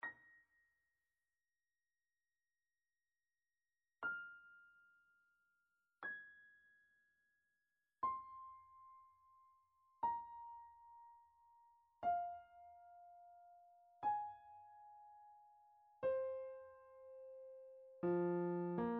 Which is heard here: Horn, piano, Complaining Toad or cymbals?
piano